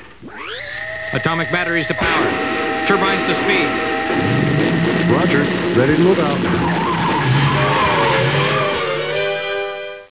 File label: car start